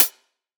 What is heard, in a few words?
Hihat; Antidote Advent; Infected Track Drums